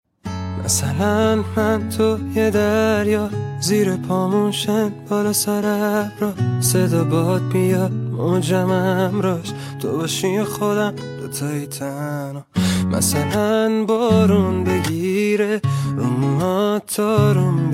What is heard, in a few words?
Guitar; ورژن با گیتار آهنگ